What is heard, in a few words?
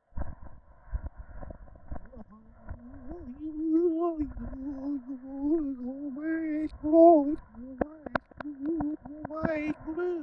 filter pan